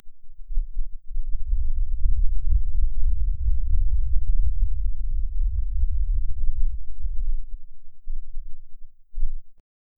airy jiggle vibrations

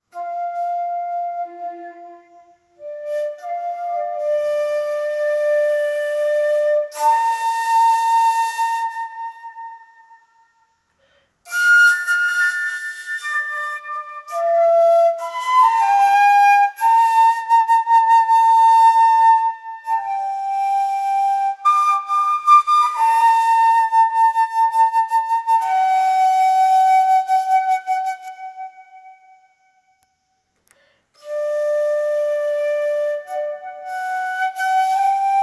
i want a beautiful chinese

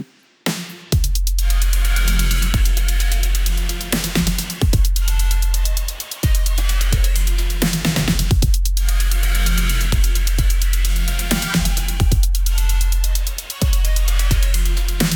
futuristic modern gothic, gothic

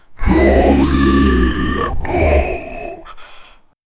demon chanting to battle

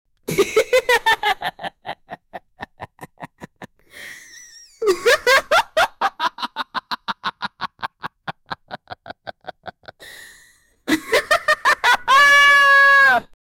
laugh, character voices; sonicexe

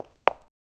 two footsteps of rubber s, shoes

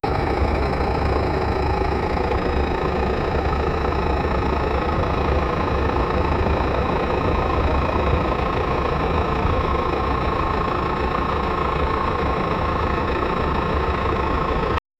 sound of camaro engine, cars

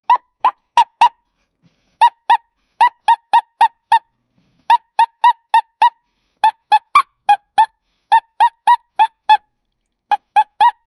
start a playful tune acti

